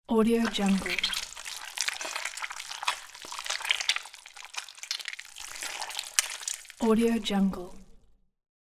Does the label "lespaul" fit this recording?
No